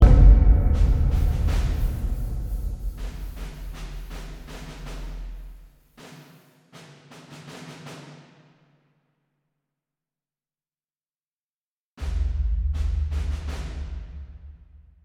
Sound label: Militant Drums; Global Conversation